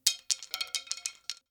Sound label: thin stick drop